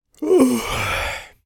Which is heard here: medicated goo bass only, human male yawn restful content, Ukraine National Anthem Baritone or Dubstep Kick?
human male yawn restful content